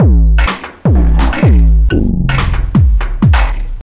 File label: sticks